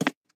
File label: Peg Leg Footstep